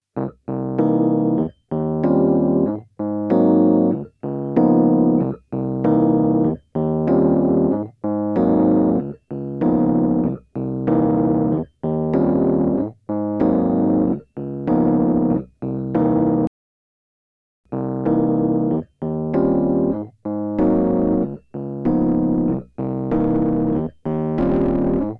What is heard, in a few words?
Rhodes